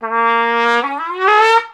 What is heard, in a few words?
MUSICAL BUGLE FAIL; lego star wars the force awakens; ps4